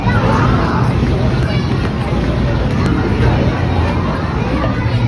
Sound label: kids